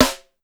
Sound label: Snare, Pitched Up; Clavia DDrum-AT